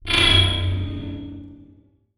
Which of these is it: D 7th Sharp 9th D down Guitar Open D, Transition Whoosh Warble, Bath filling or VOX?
Transition Whoosh Warble